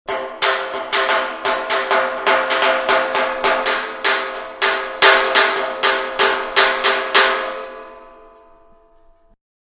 Hammer Medium-On Metal Tank-Several; Hammers (Sound Effects:Tools); Manhattan Production Music

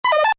Computer Beep, Short; Multimedia; Stuart Duffield

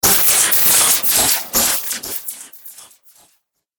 Science Fiction Laser Shards; Laser Sound; Sci-Fi Laser Sound Effect; Andrew Fleming

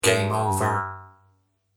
Arcade Game Game Over, retro; Video Game Sound Effects; Retro Inspired Sound Effects

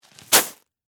Bag Rocks Down, Foley, Plastic, Set; Pebbles; Blast Wave

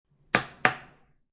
Single knock on wood; Knocks (Sound Effects:Objects)

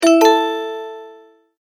multimedia tone verified

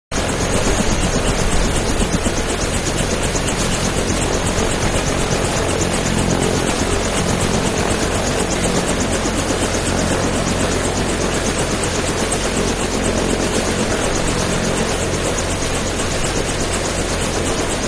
Helicopter Military Idling; Helicopters (Sound Effects:Vehicles); Manhattan Production Music

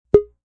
Pong; Pings; multimedia

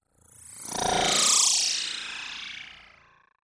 Whoosh Flutter, Digital; Whooshes; BlastWave

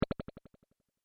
Beep Electronic Bass Echoes; User interface feedback sounds; Beeps; Multimedia

